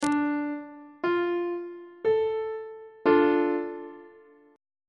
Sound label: e double flat minor chord; on treble clef